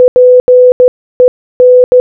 PEN Morse Code Audio

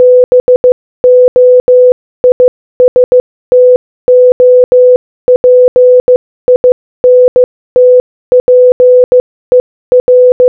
Morse Code Audio

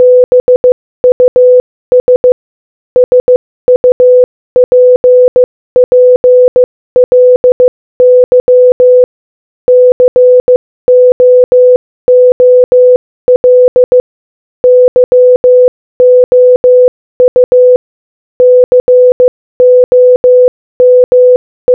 Morse Code Audio Speed 15 15

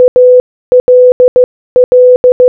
ALL Morse Code

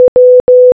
W Morse Code Audio

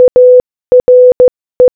ARE Morse Code

